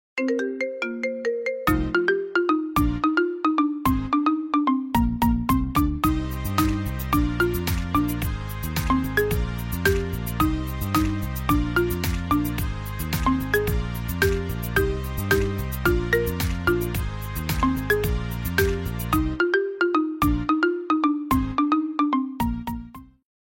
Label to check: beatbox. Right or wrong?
Wrong